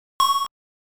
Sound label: bitten damage